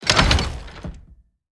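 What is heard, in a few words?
wood double light open, door; skyrim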